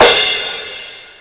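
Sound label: crash cymbal; Drum Kit 5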